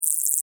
Insect Voice Chirp, Insect, Voice, Chirp, Vocalization, Bug; Sound Effects:Animals:Insects; Blastwave